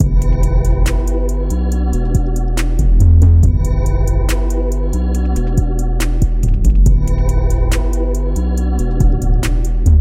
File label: different, beat